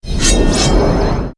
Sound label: Spring atk; Fly For Insanity